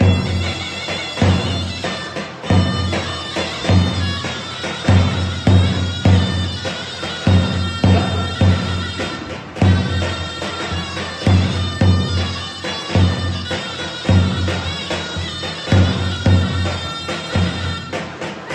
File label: rubar, dances